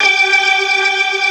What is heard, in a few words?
G4, Keyboards Old School; USB Soundscan vol.09; AKAI